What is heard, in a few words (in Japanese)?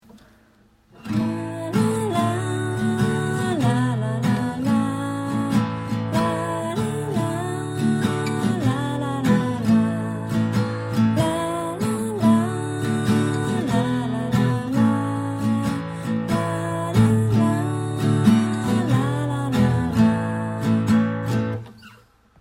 ギター に メロディ